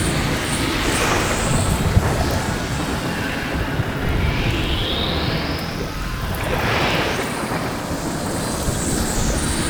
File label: Void Beacon Loop; Warframe; Missions; Zariman; Zariman Assassinate